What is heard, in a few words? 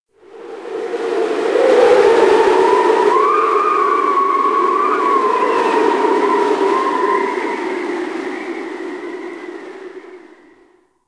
Ice Cold Wind Whistle; Warframe; Corpus Ice Planet